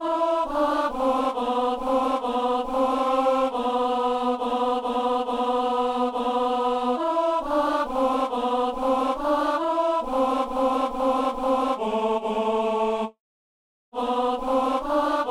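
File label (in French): ténor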